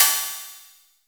Cymbal

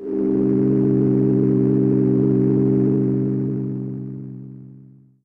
PAD

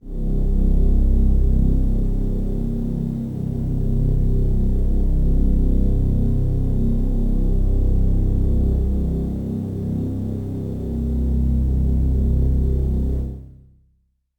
Warm Strings Kawaii C0; pads

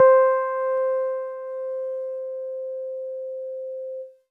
EAcoustic DX100 C4

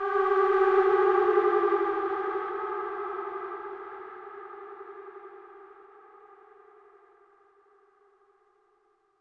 Doomsday Trumpet G3

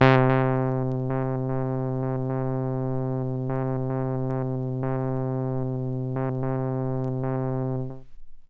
Vinyl Synths C2; Kawaii Randroid; vinyl leads

